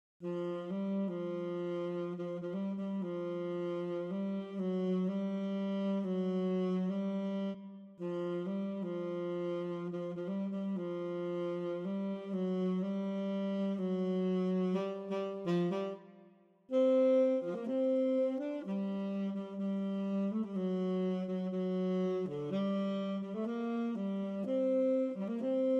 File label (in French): Saxophone tenor; Les Mysterieurses Cites d'or